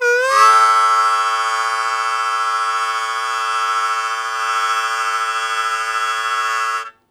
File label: Blues Chord Attack A1, Harmonica; 90 s Sample CDs; Zero G